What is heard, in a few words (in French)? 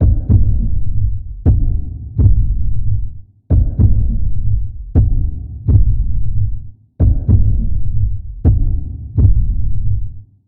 Coeur qui bat; Coeur qui bat 02